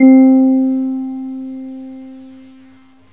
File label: E Piano; GMTones